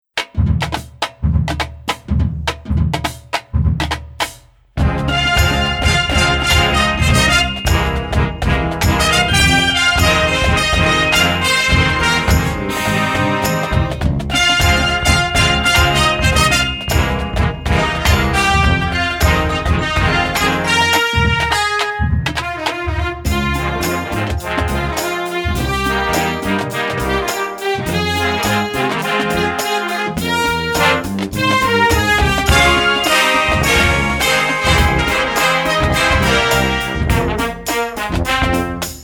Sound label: Marching Band